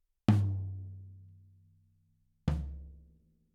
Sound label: Kit